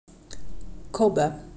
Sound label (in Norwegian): kobbe, phoca vitulina; marine-mammals